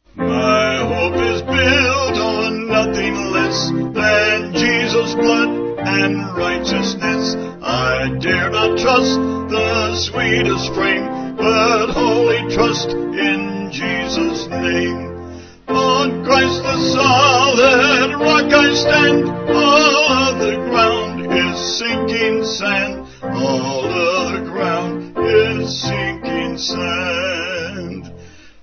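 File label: Vocals Piano, church music backing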